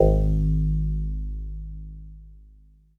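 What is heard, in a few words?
Jazz Harp G#3; Kawaii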